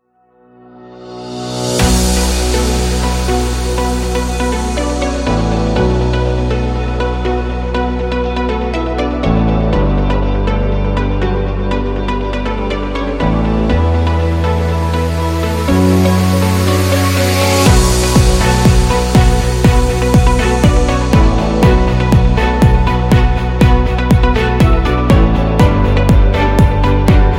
Grand Opening

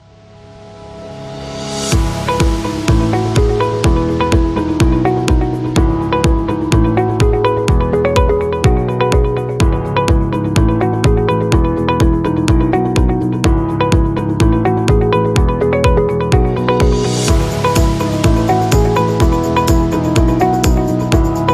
Corporate Video

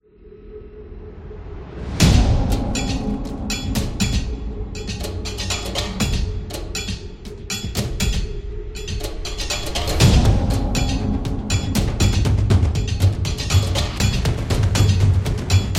The Epic Drums; tribe

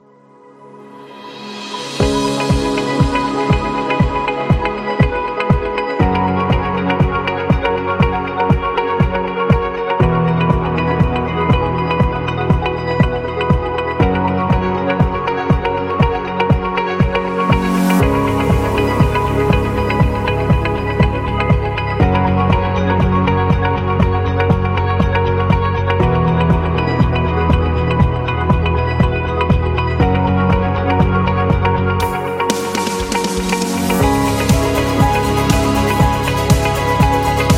Ambient Technology; business background

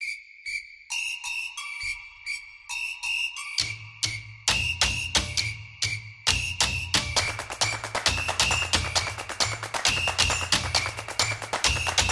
Football Background, sport drums

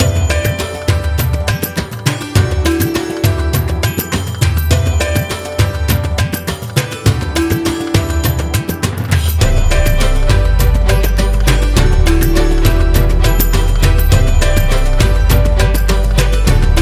Indian Positive Music

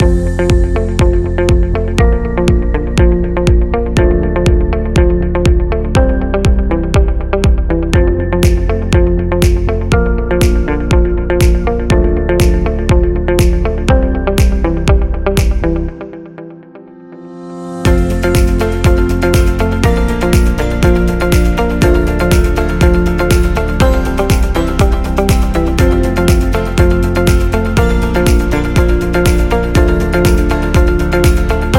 A Corporate Loop Happy; corporate interview

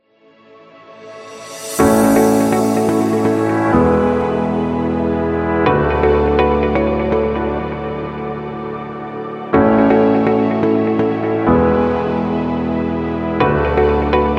Beauty Commercial

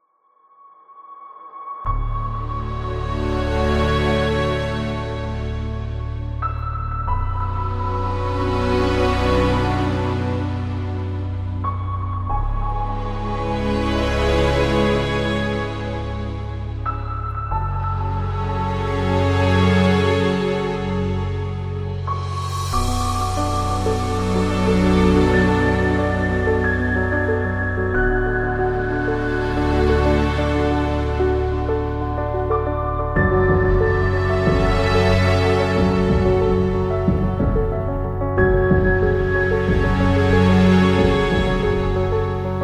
The Documentary; epic music vol 2

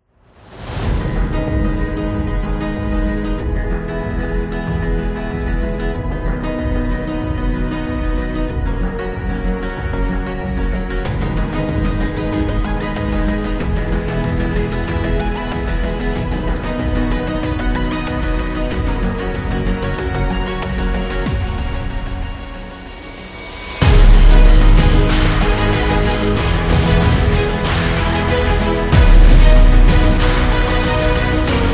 Cinematic Strings, corporate music; corporate music vol 19

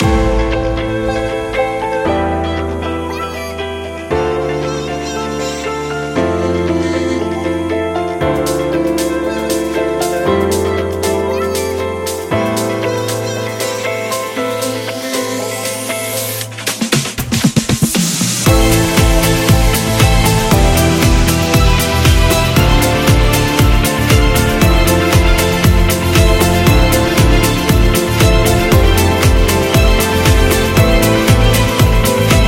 Soft, corporate music; corporate music vol 20

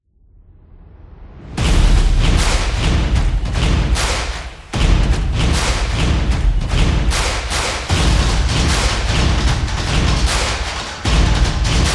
Soccer Drums

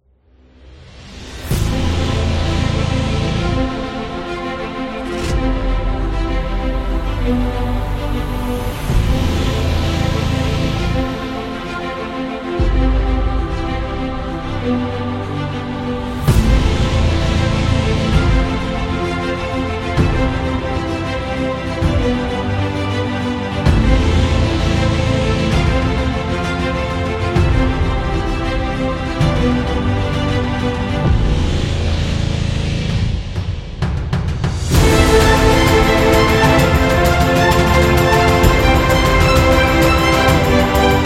Inspiration Epic Trailer, epic music; epic music vol 1

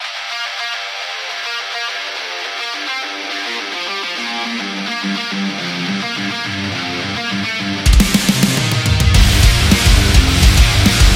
Workout

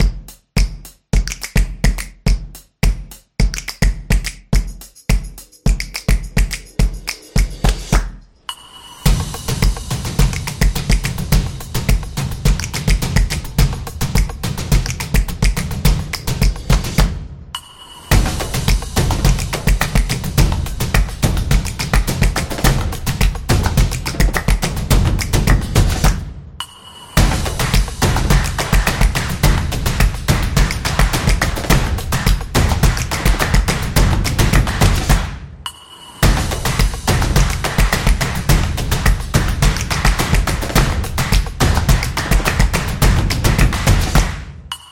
Stomp Clap Commercial

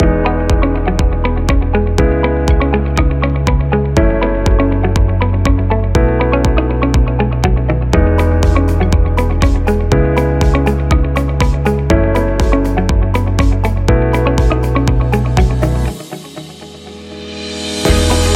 Promo Slideshow

